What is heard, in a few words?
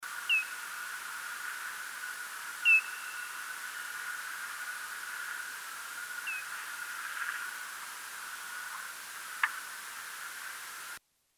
Silver-haired, calls